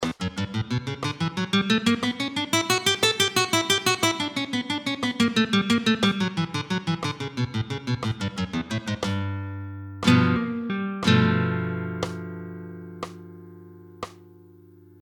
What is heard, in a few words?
Picado; FLAMENCO FACILE